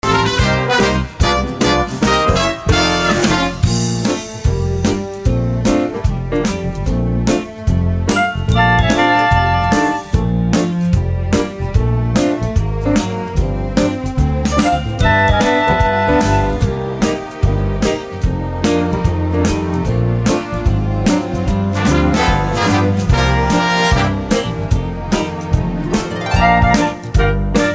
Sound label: Instrumental; audition materials; Willy Wonka Jr